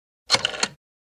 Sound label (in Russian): phone